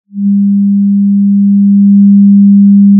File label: pop, Sound of a cruise ship horn